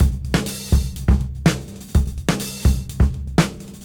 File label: Disk 125 BPM; AMG - Keith LeBlanc Phat Butt Jams